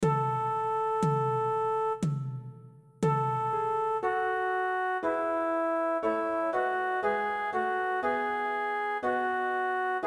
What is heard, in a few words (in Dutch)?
Breek aan o lang verwachte stond TTBB Bariton